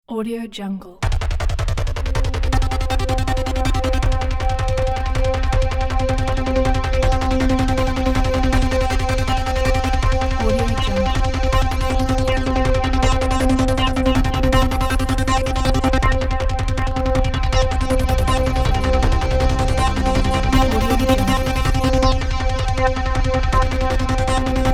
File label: scifi logo